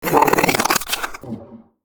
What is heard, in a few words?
Pain Light; Evolve; Kraken; Grounder3